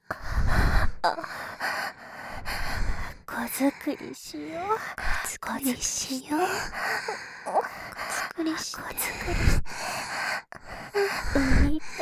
喘ぎ; シャルロッテ

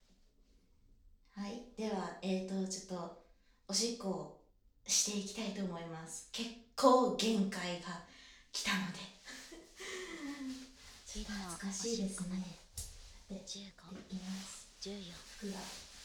おしっこ カウント バイノーラル; 実演; 絶頂カウントなど差分

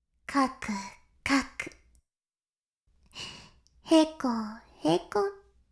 しこしこ音声, ASMR; 白くて黒い魔性円環

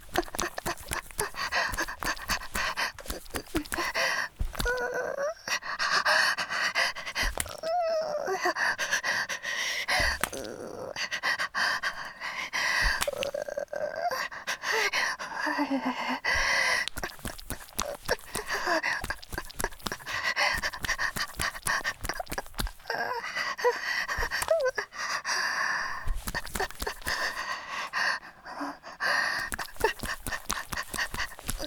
雨降り ギシアン ループ; 田舎のダウナー幼馴染の子作りを手伝って…

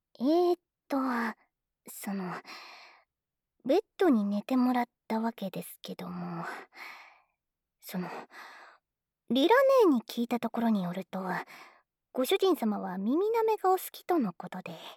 焦らしの耳なめ(右), ASMR

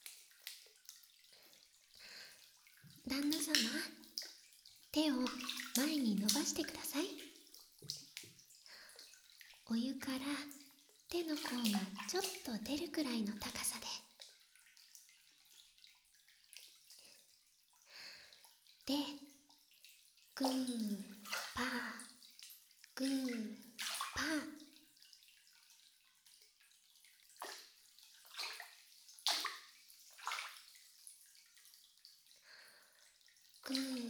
湯船 で ほかほか マッサージ; 【一緒に温泉】道草屋 たびらこ4 ほかほか風邪予防!【お休み歯磨き】; 食後-ほかほかお風呂

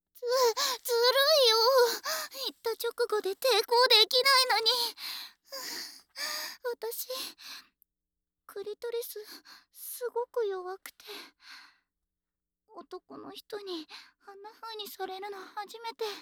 耳をしゃぶられる, 耳舐め, ASMR; 【se無し】; 耳舐めオナサポ

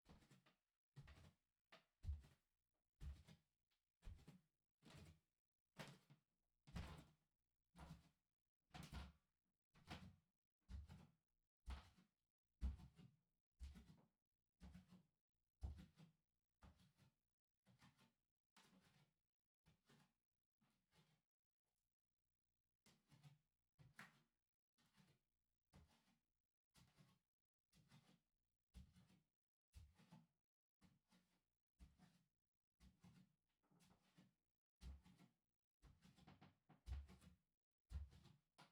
行ったり来たり 低速, 足音 裸足; KU100

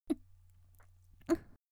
ゴックン; ゴックン（1回）; 綾波系少女

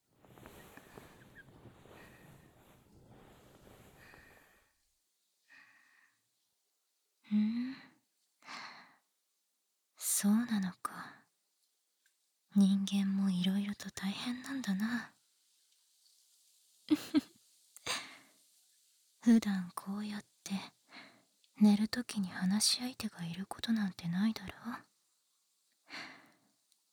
添い寝、心音、耳舐め; おやすみのお供に

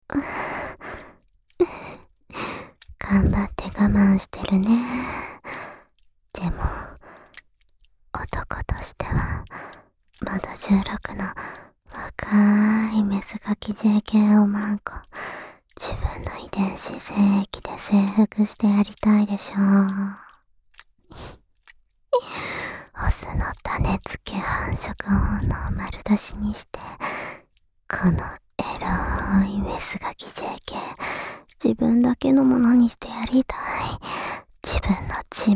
受精おねだり耳舐め♪; 小悪魔おしえごニートJKと子作りえっち♪

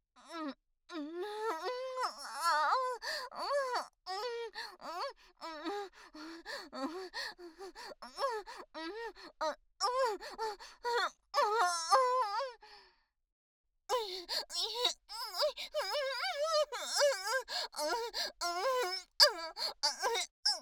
SEX・激しい喘ぎ声と悦びの声; お色気お姉さん系カノジョ

